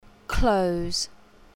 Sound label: close